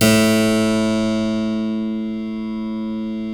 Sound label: A 2 CLAV; Keyboards Acoustic; AKAI; USB Soundscan vol.17